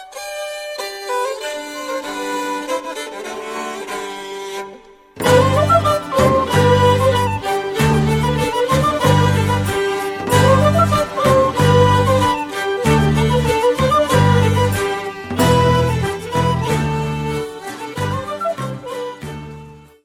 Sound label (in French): Gavotte, France